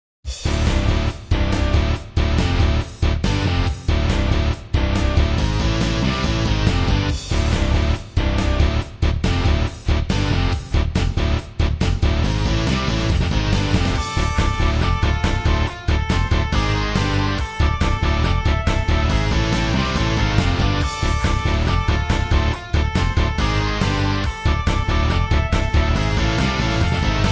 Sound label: Race BGM; Motocross Trial Extreme; android